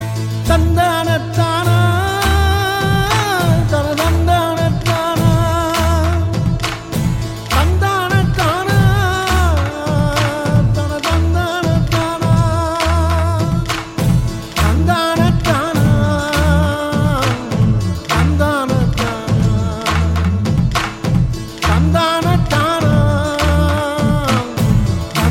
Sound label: Humming